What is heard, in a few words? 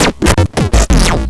grind, EMU XL-7